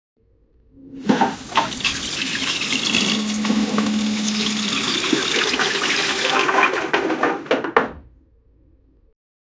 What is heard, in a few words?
dishwashing sound, kitchen